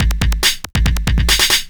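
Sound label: DRUMLOOP ELECTRO 140; Techno2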